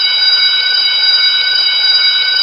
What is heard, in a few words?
Ring